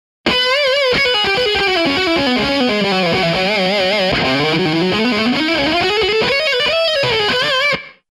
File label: Lead